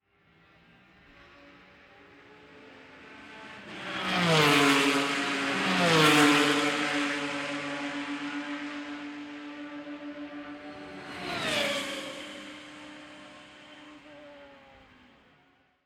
formula 3 by, Formula Race Car, 3 Cars By; Three Formula Race Cars By , Fast; Vehicles

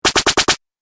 Laser Zap, Laser Gun

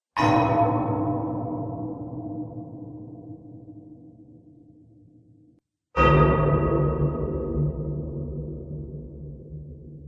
4X Metal Bangs; Miscellaneous Impact Sound Effects

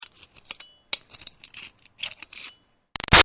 Foley Rock Picking Archaeology Moves; Rocks; Materials

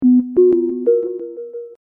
Hip Hop Synth Licks 90 Bpm B Straight; Inquisitive; Royalty Free Synth Loops

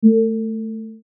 Beep Tone; Multimedia Web Interactive; Beeps (Sound Effects:Multimedia)

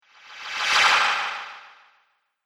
Whoosh Magic Swish; sound design

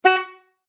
Auto Horn Honk Automobile Car; Vehicle; Cars; Vehicles

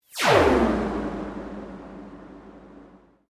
Sweep Mechanical Medium; Created sweeps, swooshes and other movement effects; Sweepers; Foundation4

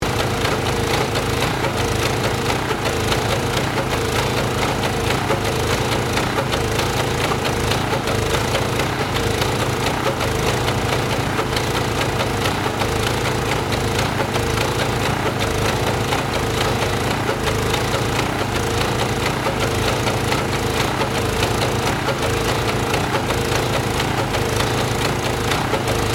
Asphalt Machine, Industry, Asphalt, Machine, Loop; Operate, Construction; BlastWave

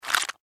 Horror Squish; Monster Sound Effects; Monsters (Sound Effects:Horror)

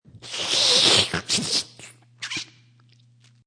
Slurp

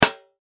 Impact Metal Dull Hit; Sound Effects:Materials; Blastwave

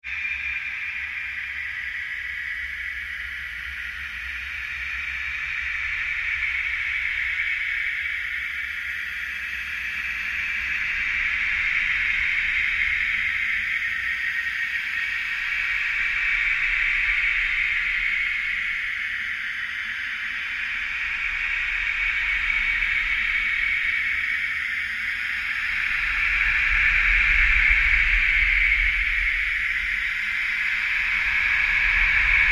Created Undulation Drone; sound building blocks created through manipulation of raw material; Rise And Dive Sound Effects

